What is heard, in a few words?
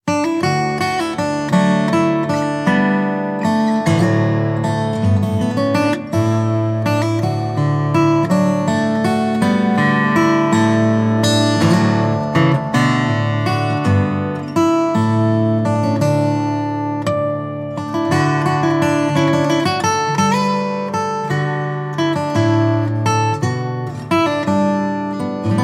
Celtic Guitar; Guitar Techniques 2019 Issue 296 June